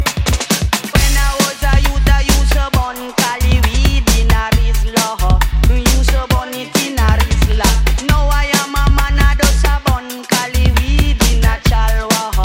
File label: mixed breaks